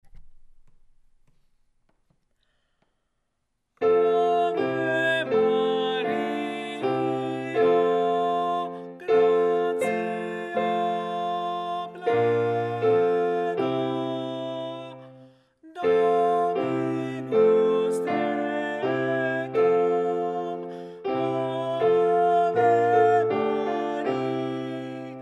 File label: Ave Maria Alto; Ave Maria, J. Arcadelt